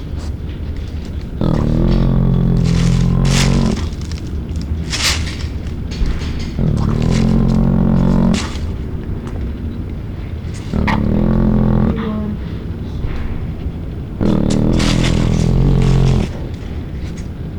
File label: hyena bone